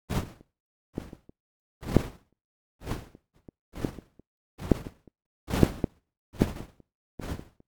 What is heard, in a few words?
synth snow; The NIW Project